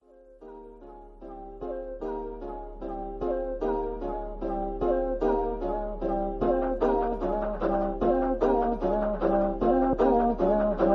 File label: rap